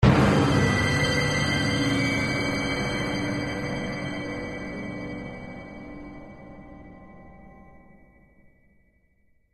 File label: Shock Stinger